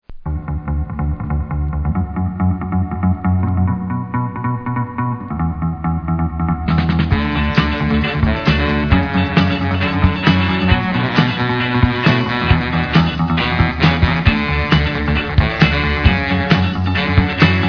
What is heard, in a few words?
beat